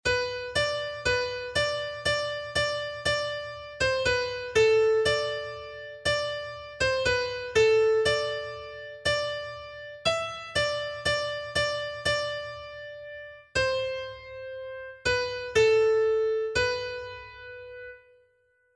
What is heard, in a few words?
regina coeli t; g aichinger